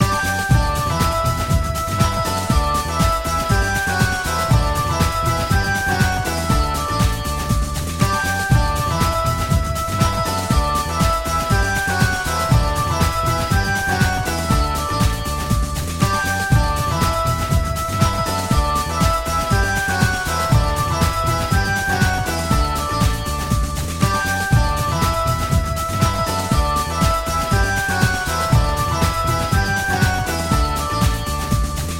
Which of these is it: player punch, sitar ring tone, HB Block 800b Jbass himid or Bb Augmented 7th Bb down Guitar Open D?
sitar ring tone